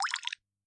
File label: hiyoko water